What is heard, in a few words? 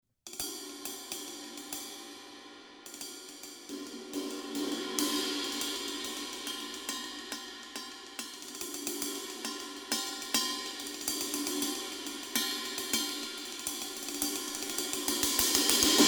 Meinl Big Apple Ride